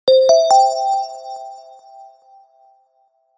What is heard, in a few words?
notification tone; Notificaciones